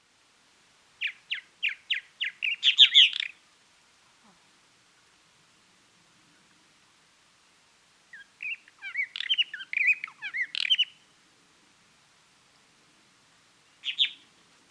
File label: Purple Martin Progne subis hesperia